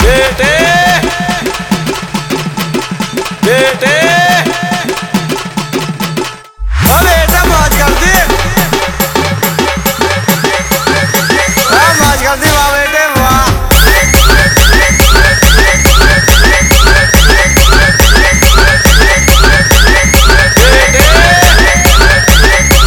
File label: DHOL × POLICE HORN MIX